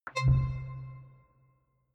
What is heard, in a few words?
click tonal reverb; signale